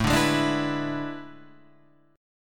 A Major 7th Suspended 2nd Suspended 4th, Guitar, Standard; strummed